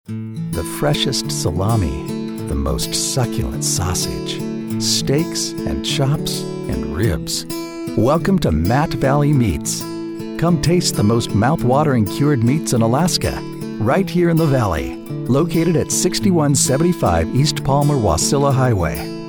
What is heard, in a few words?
Guitar